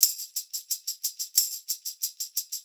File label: Tamb; 90 TAMBOUR